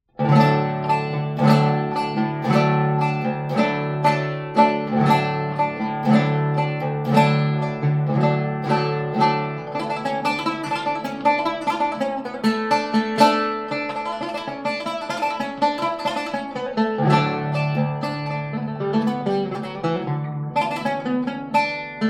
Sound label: Mahur Zeybek, oud